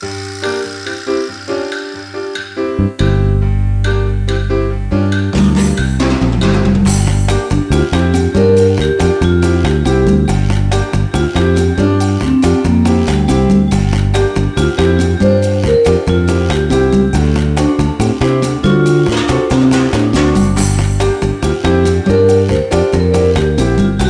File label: lounge; 3D Ultra Lionel Traintown